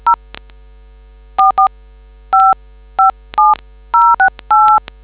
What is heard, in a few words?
dtmf